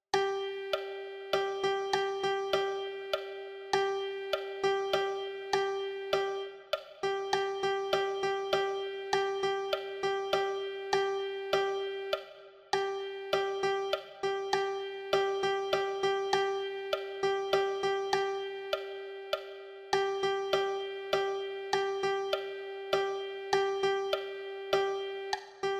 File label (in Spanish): percusion